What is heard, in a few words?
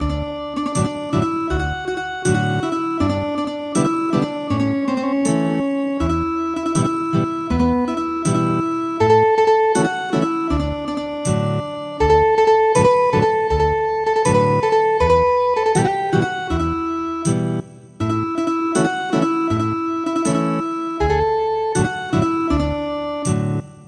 Ethnic Guitar